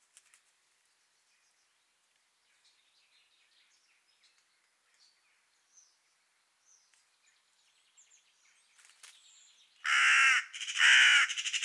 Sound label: Corvus corone; SETE